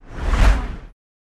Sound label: Car Oncoming By Whoo